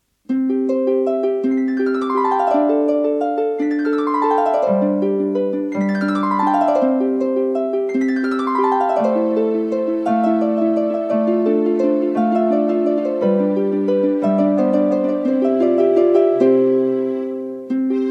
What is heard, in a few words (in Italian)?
Intervallo in Do Maggiore